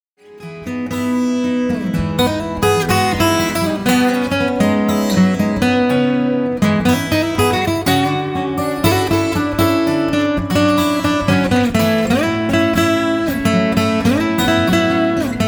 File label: stomp